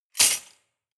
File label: cutlery large multiple drawer place hard